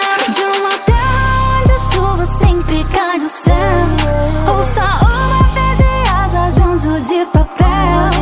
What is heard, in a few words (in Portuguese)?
rap